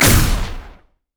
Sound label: prismatic wave; LoL; lux